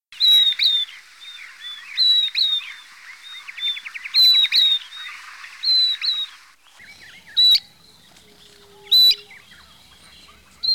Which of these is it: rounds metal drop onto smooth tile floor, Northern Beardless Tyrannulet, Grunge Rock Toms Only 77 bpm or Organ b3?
Northern Beardless Tyrannulet